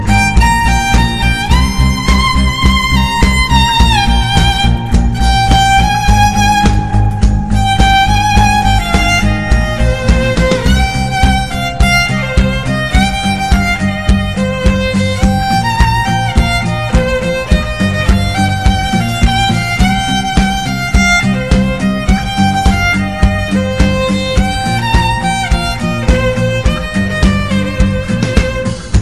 violin cover

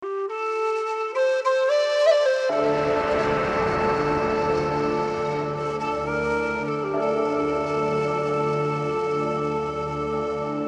goth dark flute; Electronica

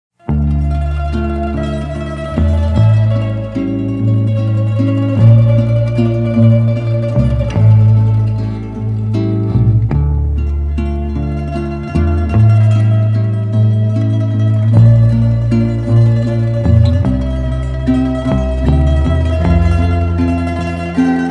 nice guitar ringtone; Instrumental

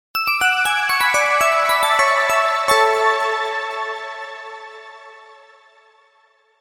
nokia bell; Electronica

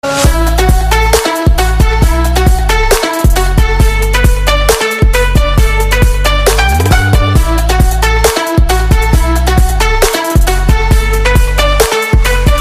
love ringtone

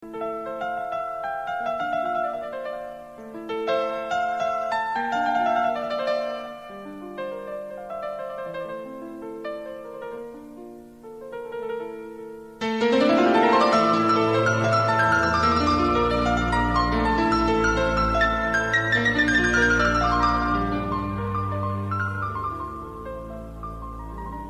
nice assyrian

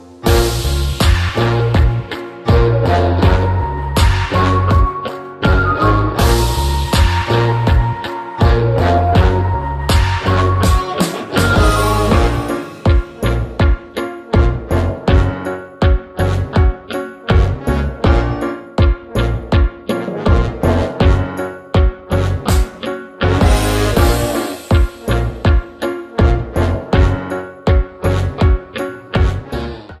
instrumental ringtone

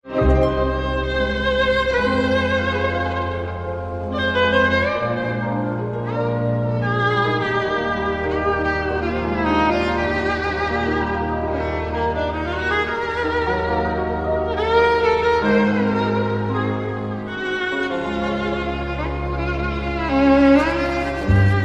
gypsy violin ringtone; Instrumental

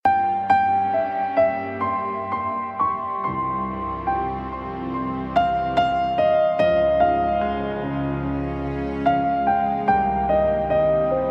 sad instrumental

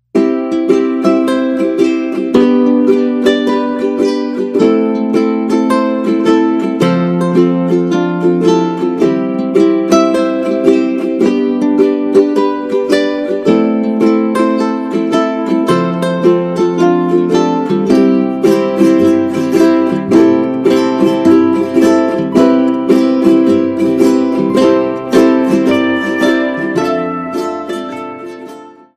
ukulele beach ringtone

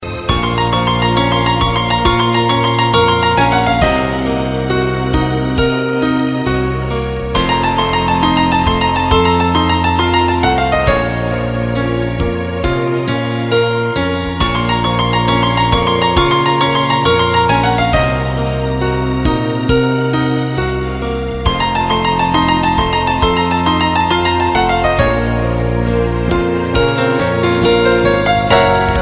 piano

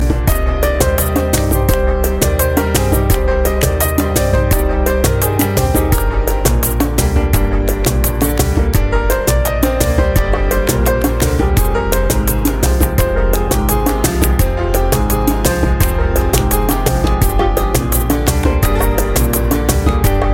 relaxing flamenco

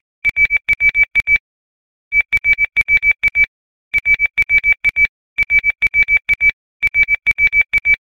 alarm clock oldstyle; Electronica

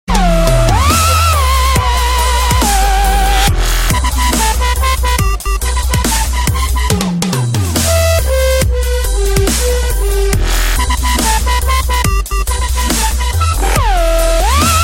cool dubstep

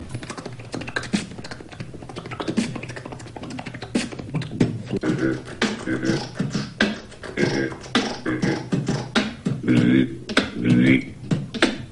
nokia ring tones

